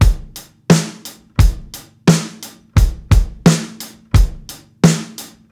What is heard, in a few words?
8TH GROOVE, breaks, drums; Basement drums vol 2